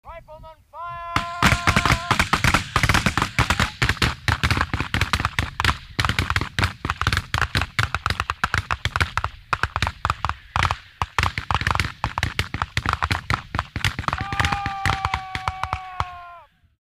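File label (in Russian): автоматная очередь длинная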